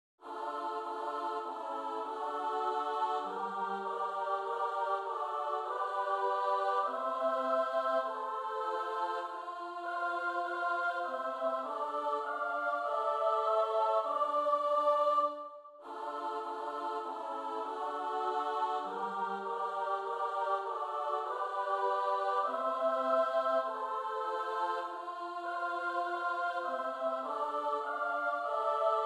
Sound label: midi; bianco; fuggite amore